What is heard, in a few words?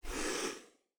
Soft Slide Medium